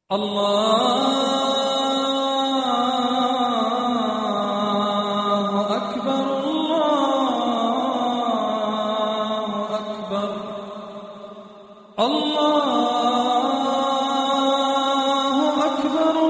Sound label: alah sms